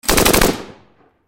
Gun Machine Gun, 7.62 Caliber Bren Lmg Short Burst Close; Automatic; Close Perspective; Warfare; Blastwave